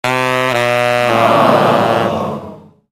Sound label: Lose Prize (with Ambience); Dumb Ways to Die 2 The Games; Android